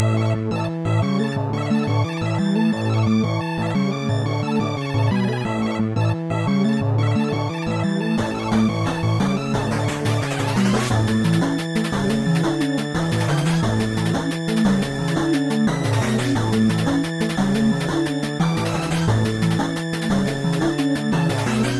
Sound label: 8-bit happiness